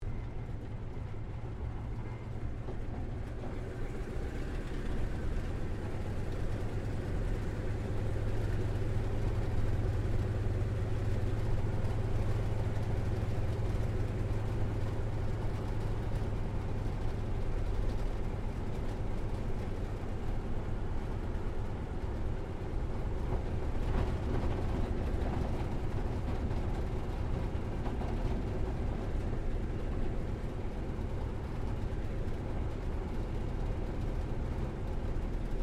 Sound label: AIRCRAFT CA-28 CERES TAXI INTERIOR; Airplanes; Vehicles